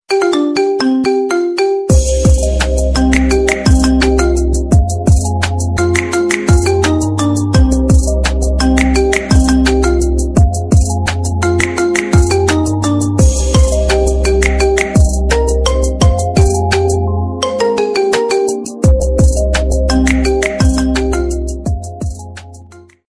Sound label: Marimba Ringtone